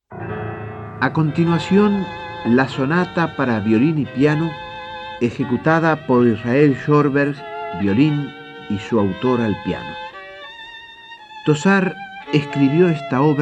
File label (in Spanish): Sonata para violin y piano; Hector Tosar; Micros radiales: audiciones de Lauro Ayestarán en el Sodre